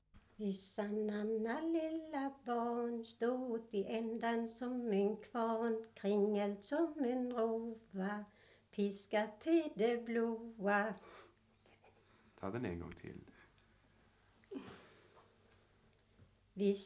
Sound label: Folk music of Småland; Vyssa nana lilla barn